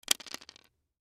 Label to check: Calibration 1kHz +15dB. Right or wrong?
Wrong